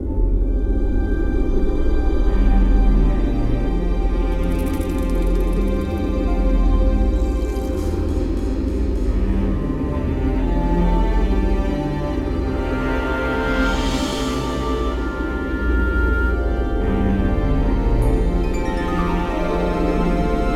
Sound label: Safe Zone Dark Temple; Safe Zone 01 Sfx Dark Temple; Dungeon Hunter 2